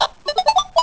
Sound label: Bubble Get; Collecting a bonus bubble (2 sounds played together); zelda